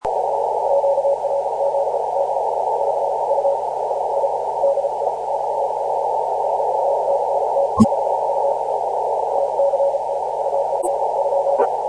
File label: 20m cw; xu7act at dj1yfk